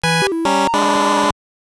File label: Lose Life; Duck Tales; Gameboy